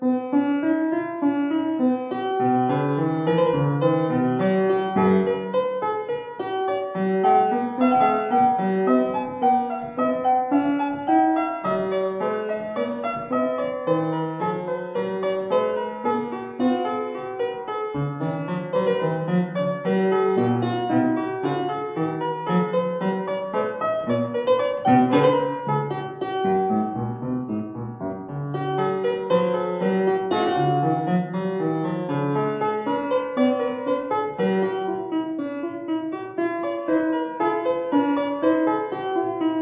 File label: Invention in C Major; Bach; BWV772